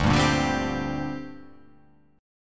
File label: D 7th Suspended 4th Sharp 5th, down